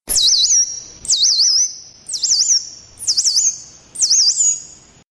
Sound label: sweet bird; animals